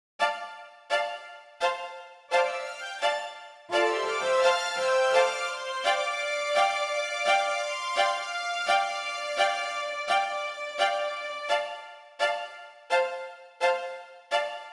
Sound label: Strings Sony DRE 2000 Reverb